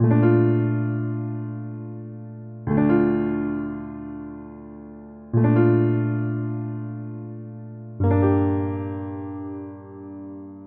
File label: Lofi Chords, lo-fi keys; clark audio